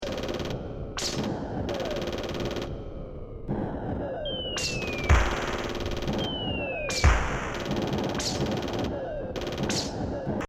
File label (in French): bruitages